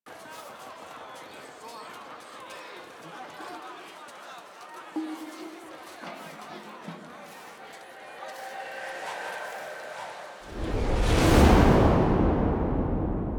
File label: Landing Ambience; Warframe; The New War; Cetus Landing